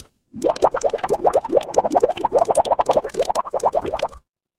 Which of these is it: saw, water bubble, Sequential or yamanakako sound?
water bubble